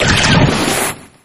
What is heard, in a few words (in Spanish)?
Grito de Dugtrio; Actualizado a la sexta generación